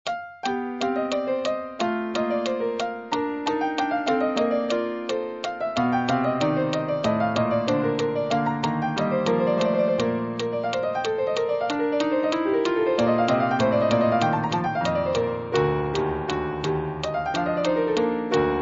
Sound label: midi classical; mozart281-3